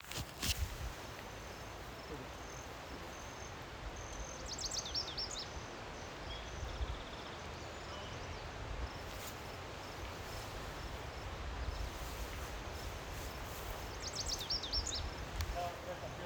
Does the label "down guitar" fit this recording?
No